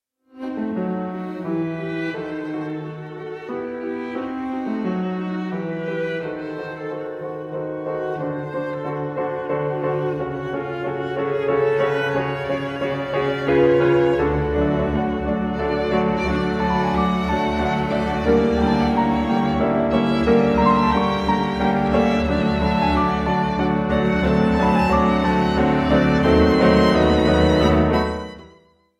quintet no 1 in a minor op 30 for string trio double bass piano